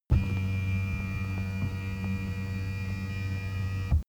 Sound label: car seat massage engine hum